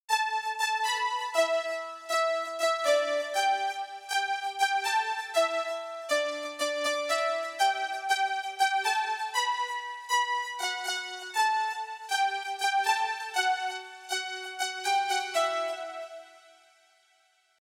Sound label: junior lead